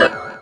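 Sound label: chord organ, Stabs, House; E Lab; XTc Files of House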